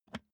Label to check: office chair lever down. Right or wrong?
Right